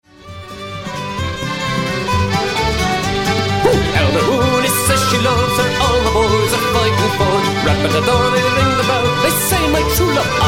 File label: Irish Band